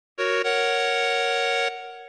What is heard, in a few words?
Lesson complete; Relaciona Palabras en Ingles